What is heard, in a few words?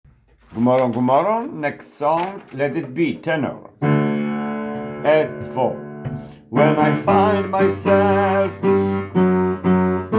tenor